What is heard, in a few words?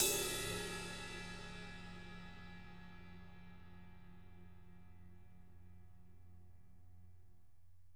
RIDE, Drum Kits; Sonic Reality